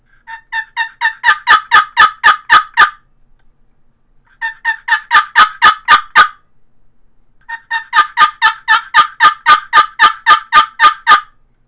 golden deluxe poplar custom box call yelps, turkey; Southland Sounds